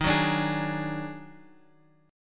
D sharp Minor Major 7th Double Flat 5th D sharp, down, Guitar, Open G